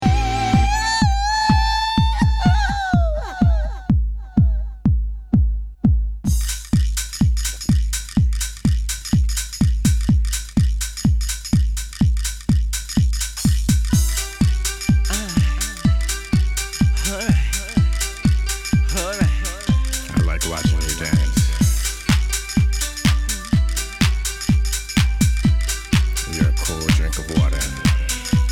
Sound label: Duet